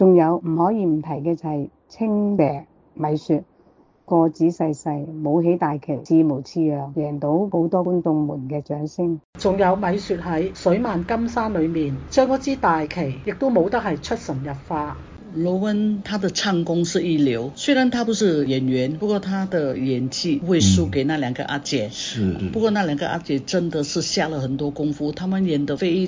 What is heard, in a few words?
audience scene; stage musical 80s 1982